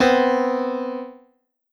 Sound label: dissonance